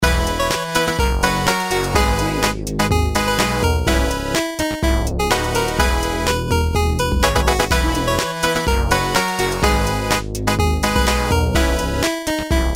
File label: 8-bit Dancer